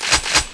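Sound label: weapon pickup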